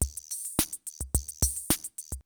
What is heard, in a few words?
CR78 rock; 90 sSampleCDs; Crypton - DrumBase5000